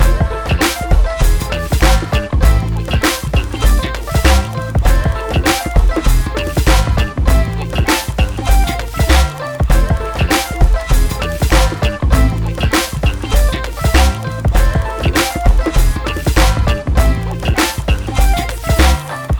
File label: Reiwa Groove; ハイジナカムラ